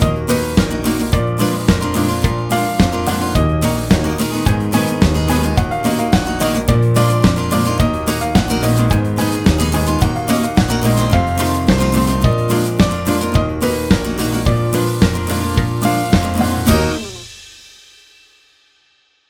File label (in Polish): tam tam tam podklad; Aku Gitara